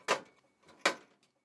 Footstep Metal; gamerip; ps4; This Is the Zodiac Speaking

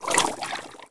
Fluid Vitesse FX Water; Puddle

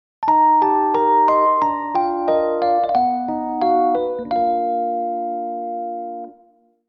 game over; new super marisa land pc

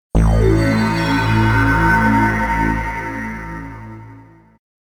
Silence; R-Type Original Sound Box

